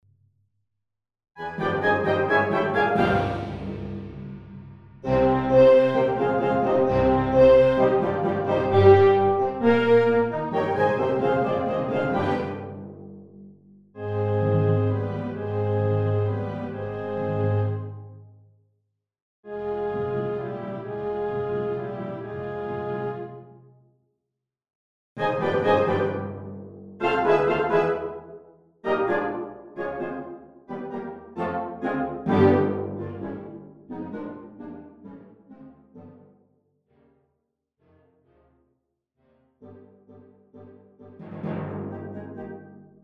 Piano Concerto; Rachmaninov

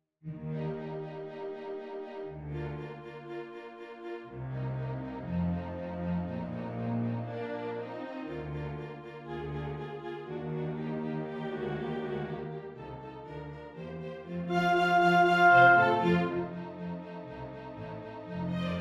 Horn Concerto; Mozart

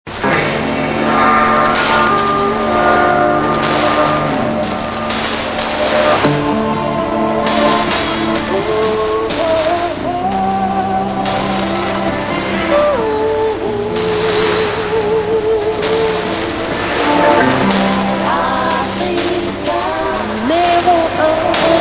JINGLE; AFRICA N 1

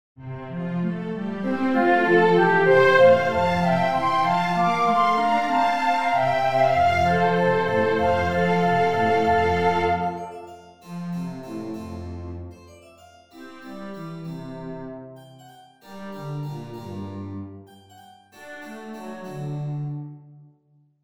Harp Conc; Haendel; op 4 n 6

